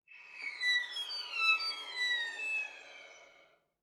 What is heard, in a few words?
Violin Slide Down